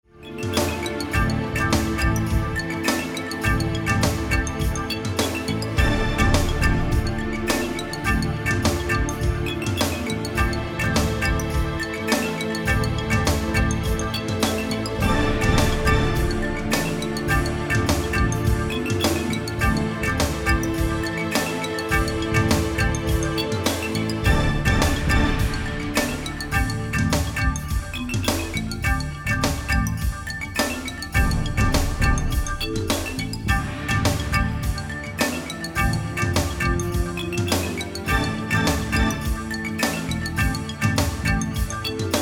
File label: Marimba